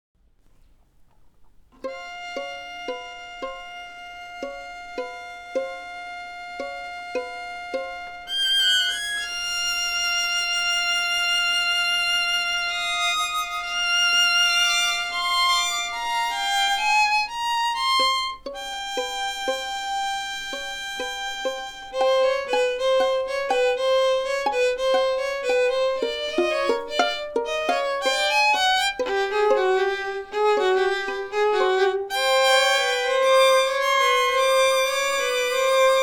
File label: Strings violin excerpt; Galerie Neue Meister